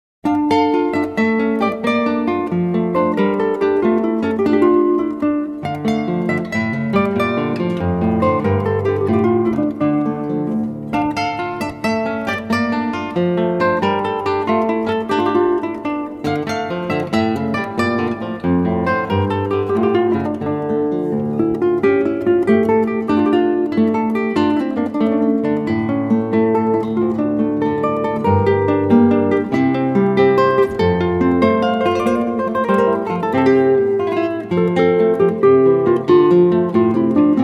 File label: Gigue en Rondeau, gitarrenduo; Winterthurer